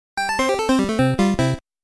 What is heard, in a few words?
game over